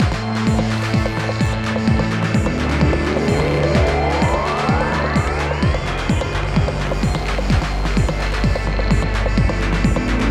Sunday thick melodic textures (AR, A4, MM2 for the brass lead, Dr A for fx, Model 1 overdrive on fx returns)